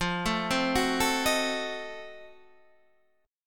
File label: F 7th Flat 9th F down Guitar Standard; F7b9 arpeggiated